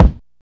mid-range bass drum single hit C key, kick one shot tuned to the C note